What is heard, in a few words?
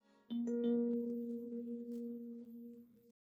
ping